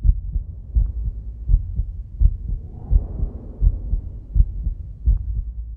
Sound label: heartbeat lp; smite; thanatos